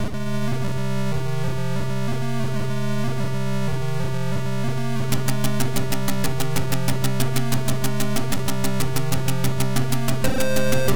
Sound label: Tribal